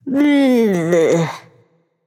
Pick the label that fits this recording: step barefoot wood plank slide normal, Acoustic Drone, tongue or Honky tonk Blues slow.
tongue